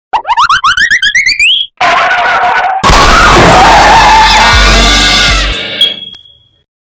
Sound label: real car crash